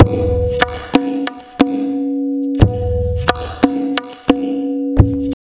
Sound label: Tabla, 90 BPM; Zero-G; Pure Tabla